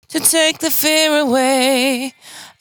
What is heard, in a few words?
92bpm Bb, Velvet Vocal; Zero-G